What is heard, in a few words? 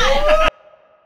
Woooh Vox